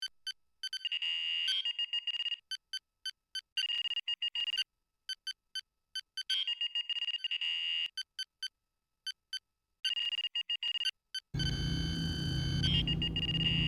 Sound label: computer beep